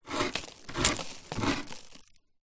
household ice frost build up scrape inside freezer